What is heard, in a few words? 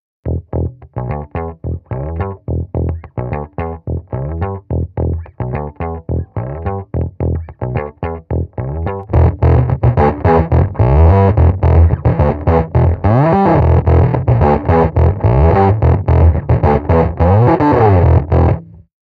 bassbully fuzz, pedals